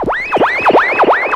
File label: Fx Dub; Zero-G Jungle Warfare 1, 2 & 3; 90 s Sample CDs